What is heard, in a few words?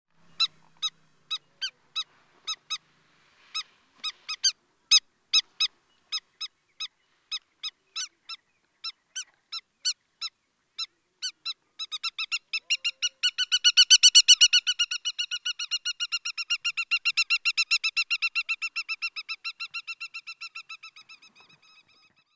River Lapwing Vanellus duvaucelii